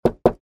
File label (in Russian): dva udara